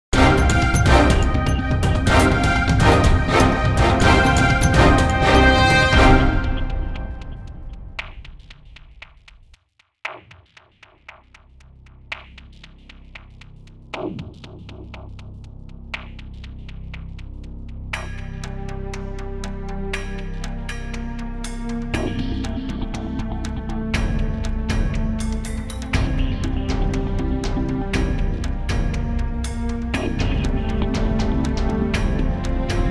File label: Suspense Action, Tension Action